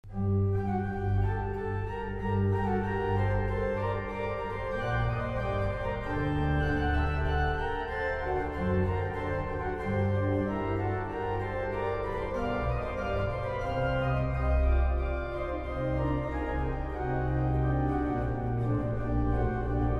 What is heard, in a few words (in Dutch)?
orgel